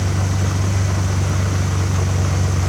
Enemy Police Car Move Loop

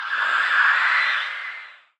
Ghost Death; Folk Hero

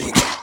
Swamp boss Spit; Folk Hero

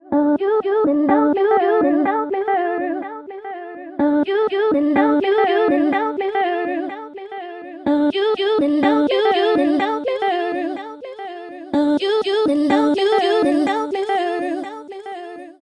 vocals Verse 32 beats

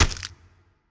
Impact Gore Crunch

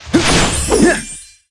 Medica attack

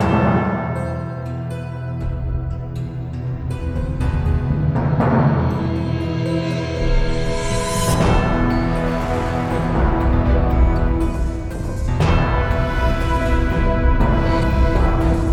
Battle

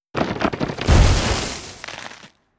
Roots Spell; Treant

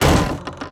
Water Splasher; gamerip

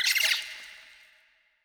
Cave Spirit

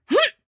Cloth Combat Jump; Hollow Knight

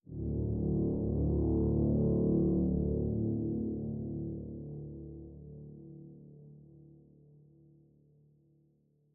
Loop End

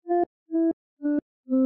Melody Rev; viewfinder

